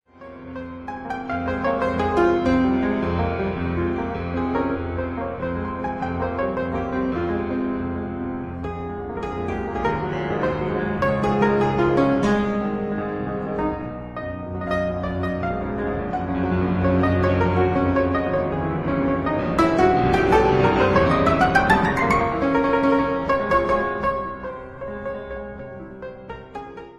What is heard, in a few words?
Etudes for piano in F minor